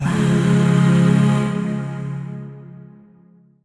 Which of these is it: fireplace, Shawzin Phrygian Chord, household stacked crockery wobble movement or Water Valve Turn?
Shawzin Phrygian Chord